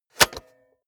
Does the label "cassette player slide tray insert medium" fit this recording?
Yes